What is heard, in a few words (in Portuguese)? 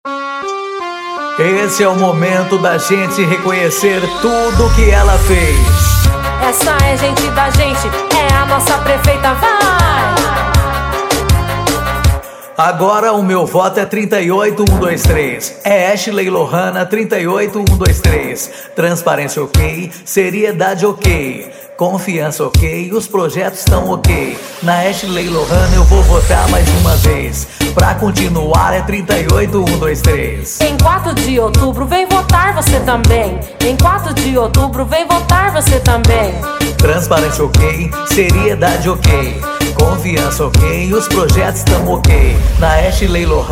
jingles politicos funk; Funk Reeleição; Para Prefeito E Vereador